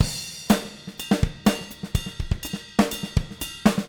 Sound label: 124 CRSH, Living Drums